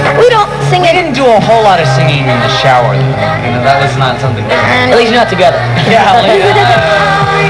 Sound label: shower; Shower...Together?